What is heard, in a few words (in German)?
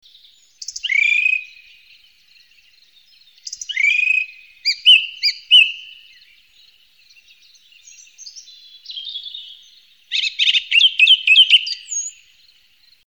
Singvoegel Singdrossel